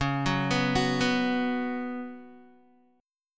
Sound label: C sharp 7th Suspended 4th C sharp, Guitar; C#7sus4; arpeggiated; tuning=4F,4A,5C,5G,5C,6E; guitar chord